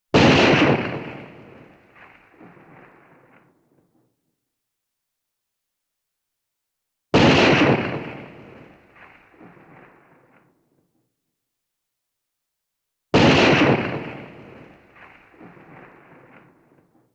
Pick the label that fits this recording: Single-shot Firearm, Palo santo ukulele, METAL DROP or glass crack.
Single-shot Firearm